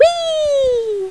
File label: weee